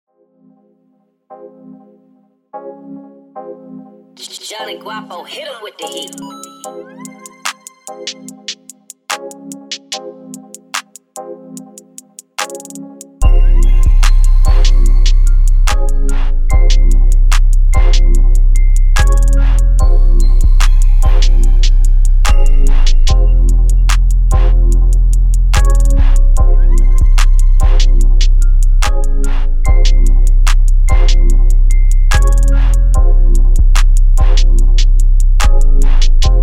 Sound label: Instrumental